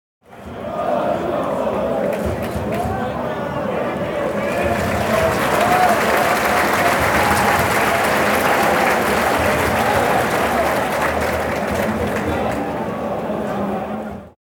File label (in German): Stadion Fan und klatschen